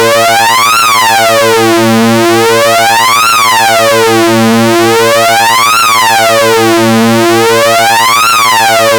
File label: vco 1 saw synced to vco 2 vco 1 lfo modded vco 2 steady, analog synth; analog synth 101